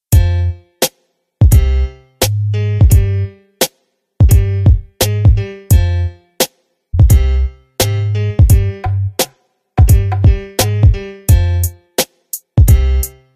base de rap

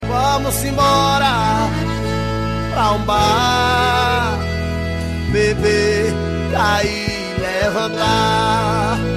bebê e peido

